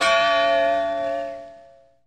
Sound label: vucuous, Bells; Misc Percussion